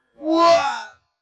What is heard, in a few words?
waaaaa